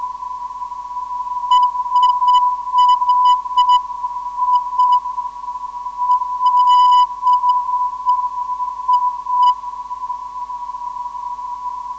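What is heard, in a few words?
425kHz, non-directional beacon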